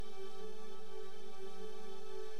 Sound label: background texture modulated synth 100 bpm; instruments; loops